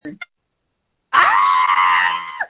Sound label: screams